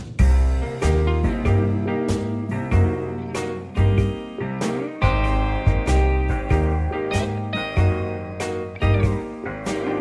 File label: Smooth Jazz Ringtone